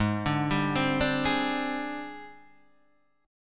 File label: G sharp Major 7th Flat 5th Guitar DADGAD; 6,5,5,5,5,6